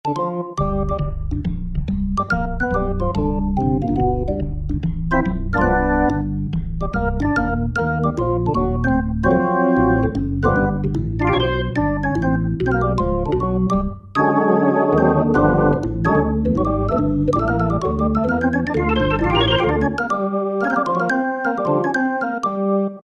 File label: Jazz Prc; Factory Preset examples